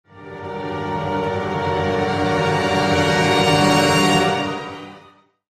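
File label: Music Transition Orchestral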